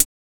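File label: HH, hi-hat; X-Static Goldmine 1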